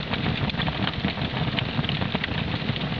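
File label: fire crackle, Vuur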